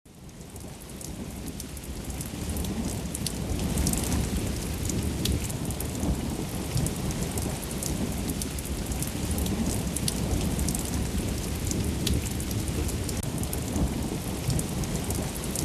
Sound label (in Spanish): solo lluvia